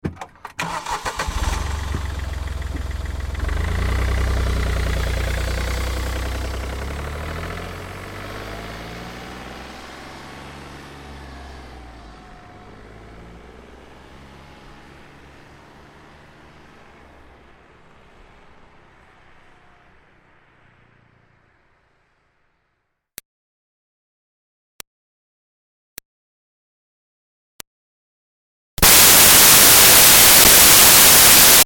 Car Smart Car Start Away Slow; 2005 Smartcar Fortwo; Vehicles; BigRoomSound

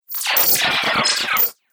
Science Fiction Laser Borg Beam

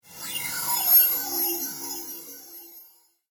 Electrical Whooshes, Science Fiction

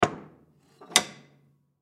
Stove Wood Burning Latch Door Shut Antique; Stove Wood Burning Close Door Latch Shut Antique; Household; Appliance

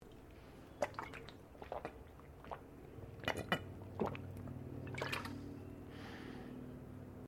Male Drinking Water Bottle; Male Vocal sounds; Human

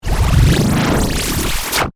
Sweeper, Whoosh, Transition; Production Element, Imaging Element, Accent; Sweeper Sound Effects; BlastWave

